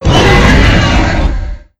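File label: raptor attack; sf effects; sinfar haks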